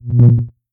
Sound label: Woop Transition